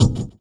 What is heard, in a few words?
Vinyl Kick; Sonic Foundry (Sony Creative Software); Esoterik Beatz; Vinyl101 Kit